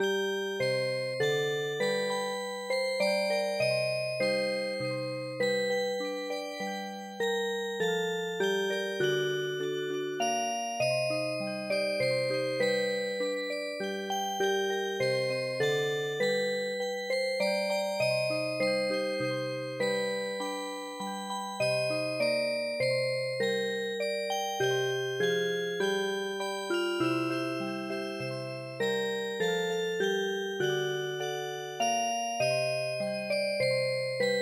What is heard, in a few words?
Music Box, midi traditional; Where griping grief